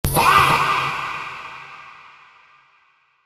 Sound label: faah